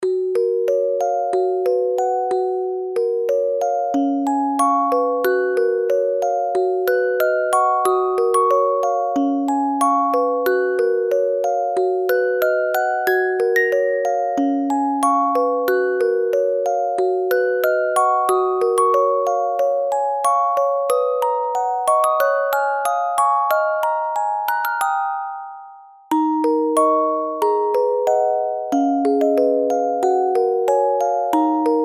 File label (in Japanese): オルゴール